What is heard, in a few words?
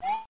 Jump; SMA2